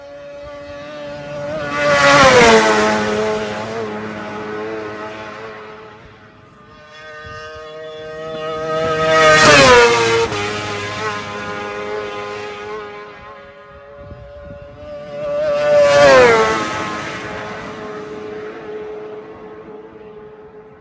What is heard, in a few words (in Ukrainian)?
moto, dopplersound